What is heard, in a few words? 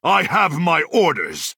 soldier attack; skingrad